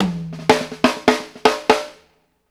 HIGH TOMS, drumworks fills; Sampleheads; New York City Drumworks VOL-1; KD FILLS 120

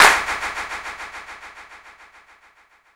Clap Attack; Zero-G; Total Drum Bass; Hits and Bits

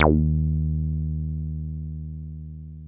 303 C2, TB 303; Hollywood Edge; Giorgio Moroder Rare Synthesizer Collection

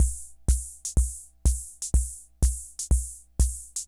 Ace Tone Rhythm Fever Loop; Drum Machines; 300 Drum Machines

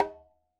Conga Hit, Latin Percussion, One Shots; Sonic Foundry (Sony Creative Software); Joe Vitale Latin Percussion

